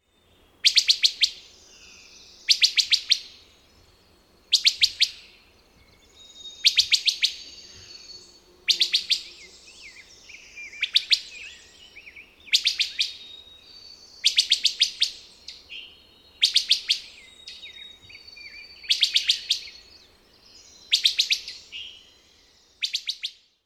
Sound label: wood thrush pit volleys